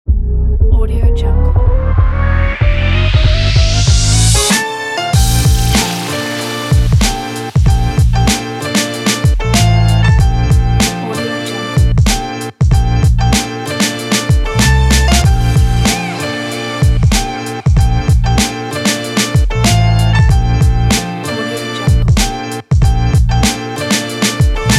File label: hip hop instrumental